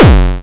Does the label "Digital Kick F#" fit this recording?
Yes